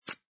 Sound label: grab card